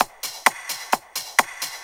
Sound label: straight, Techno Trance Essentials drum loops